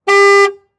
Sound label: Truck Ford Ranger Honk Horn Medium; transportation